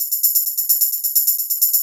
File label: drum 130 hihat, Techno Trance, Drumloops